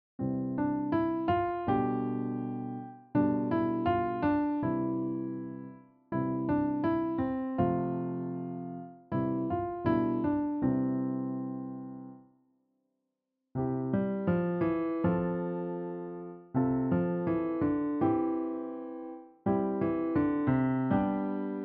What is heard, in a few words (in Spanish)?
Posiciones de los acordes